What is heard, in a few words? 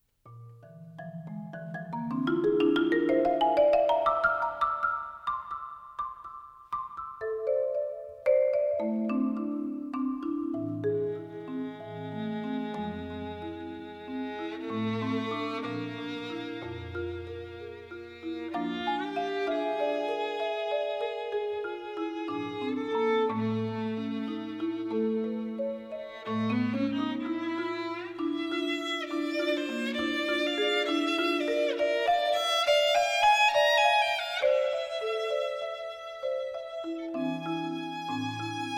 Violin and Marimba